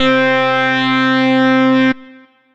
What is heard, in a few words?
Funk Synth; Synth Lead; Funk Synth 2; Ableton; Factory Packs; Chop and Swing